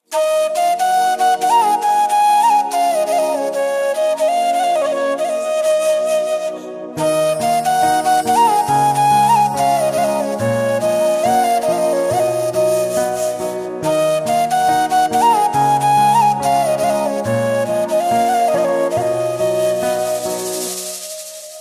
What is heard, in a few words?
flute ringtone; krishna